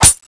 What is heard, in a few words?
hit; Valorant; cstrike